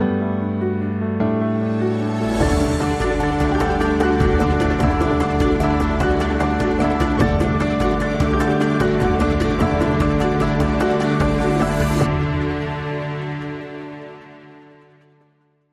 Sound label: Bumper